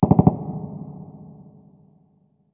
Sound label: dist machinegun